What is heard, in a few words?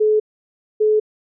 call waiting